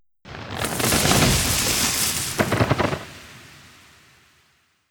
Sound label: Treant Poison Spell